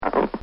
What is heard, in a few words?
Cartoon Sound Effects Flap; Cartoon Sound Design; Bobby Cole